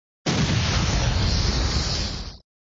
Sound Explosion, flipper; Explosion v1

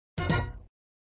Sound Fanfare End of Ball, flipper

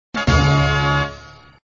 Music, flipper; sttng

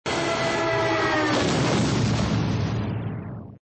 Sound Airplane crash and explosion

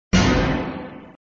Sound SFX, flipper; sttng

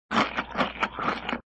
Sound Lip smack; prior to burp; Indy

